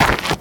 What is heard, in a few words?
dirt; svencoop